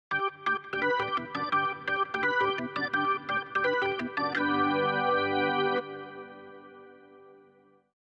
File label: organ